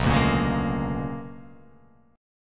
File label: B Minor Major 13th, chord; BmM13; strummed; x,2,0,3,1,3,0,x; JGuitar